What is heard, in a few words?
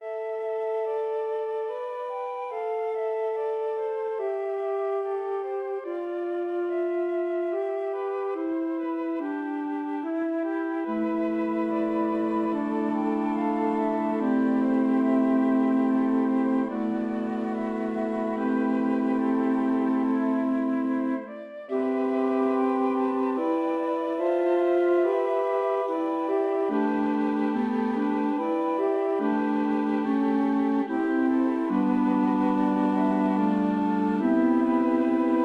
flute choir; ravel